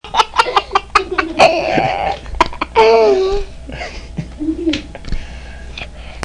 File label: Laugh